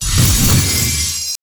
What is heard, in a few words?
Level up; FlyForInsanity